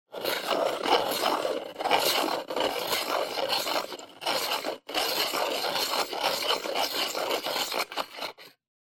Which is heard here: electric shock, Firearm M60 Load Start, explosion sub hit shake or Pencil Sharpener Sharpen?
Pencil Sharpener Sharpen